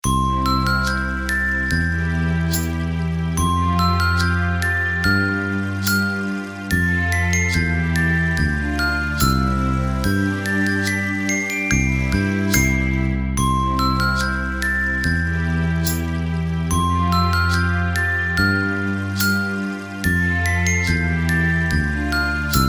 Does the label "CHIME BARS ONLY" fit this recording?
Yes